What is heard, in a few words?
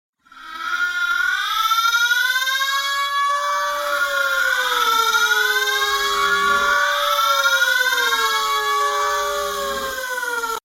siren